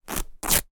foley t shirt rip tear

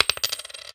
money coin drop; sound effects one

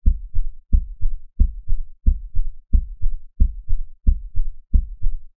human heartbeat med fast steady designed

